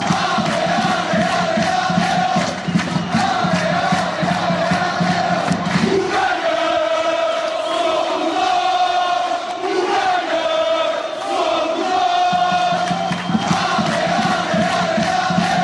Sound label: great braga fanchants